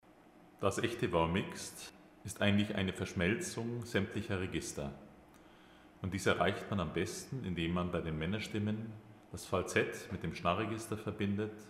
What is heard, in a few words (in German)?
Voix mixte Registerverschmelzung